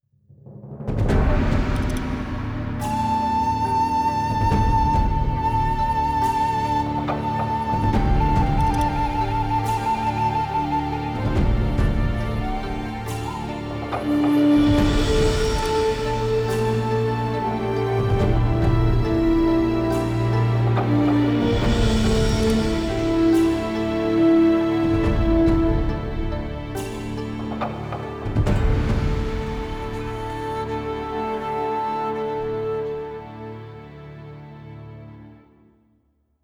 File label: japanese defeat